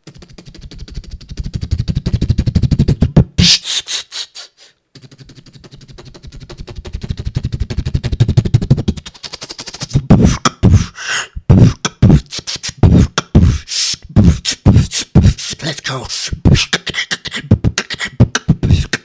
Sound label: wake up bbox